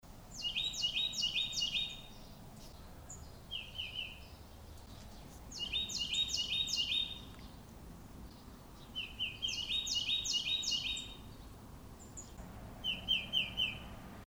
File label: c wren and bc titmouse